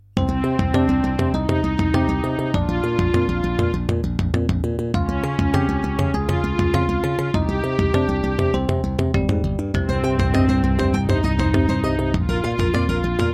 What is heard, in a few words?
Aztech Sound Galaxy Wave Power; Hocus Pocus